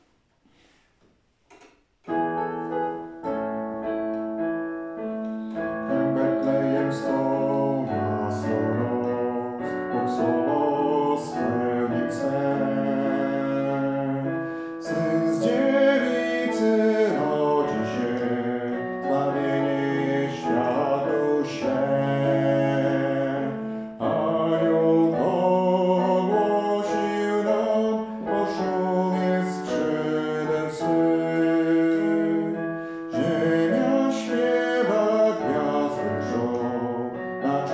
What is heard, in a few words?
wtebetl alt